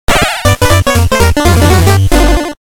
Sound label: Death jingle; curse crackers for whom the belle toils switch windows gamerip 2022